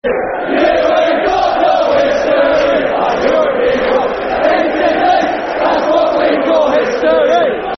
Fuck Off Chelsea Fc, Football Chants (Soccer Songs); Liverpool Lfc